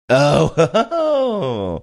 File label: oh ho ho hoooo